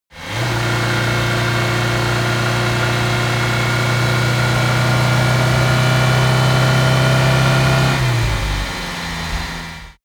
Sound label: car dacia driving high rpm